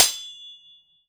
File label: melee; bri bri bicus